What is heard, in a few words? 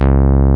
TAURUS; Taurus 2